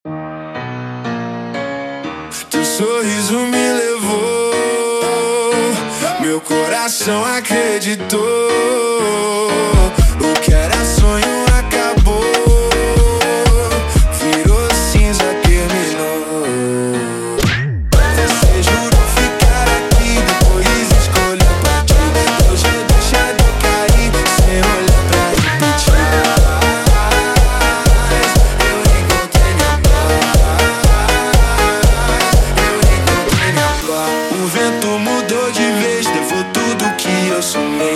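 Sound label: slowed, phonk music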